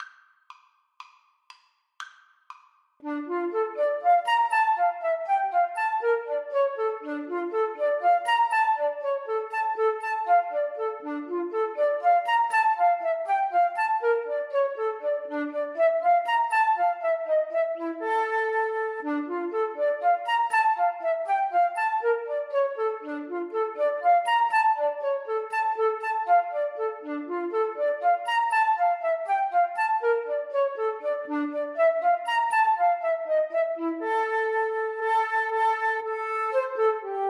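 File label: flute duet karaoke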